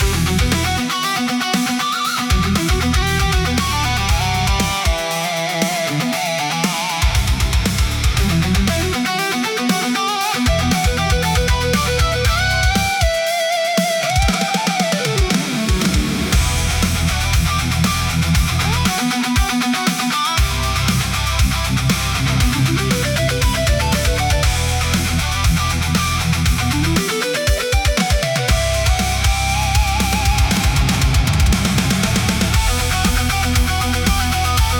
modern metal for dark aggressive

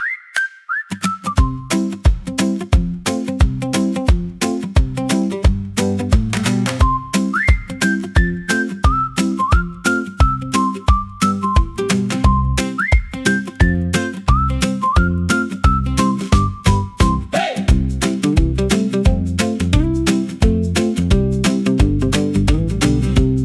whistles, for cheerful active; morning

